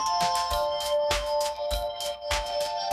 notification

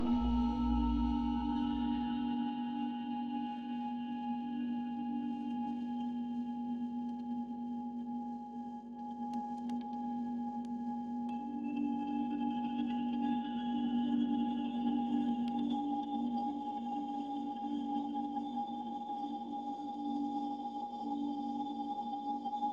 ambient meditation

